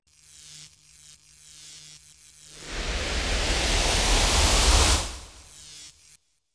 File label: SFX Electric Charge; CastleVania 64